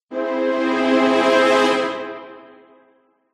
MUSICAL ORCHESTRA Jingle Brass And Strings Lurking; smartsound